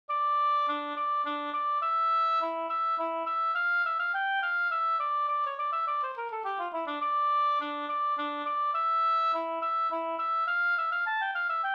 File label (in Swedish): slangpolska polonesse